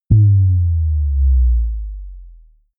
bass drop simple; whooshes rises; hits impacts

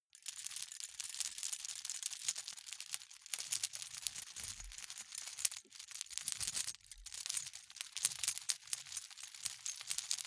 key ring large rattle air sequence soft; objekte; schluessel

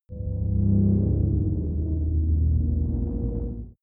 abandoned facility

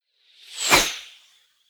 bow and arrow flyby air; krieg-waffen; pfeil-bogen; soundpool

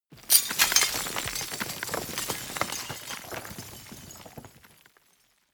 roll fall; industrie wirtschaft; baugewerbe

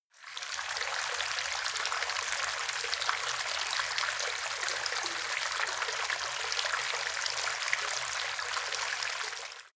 bathtub running water; haus garten; badezimmer

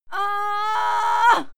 female fight moan pain heavy; schreie; menschen

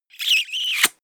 kiss smooch; koerpergeraeusche; menschen; soundpool

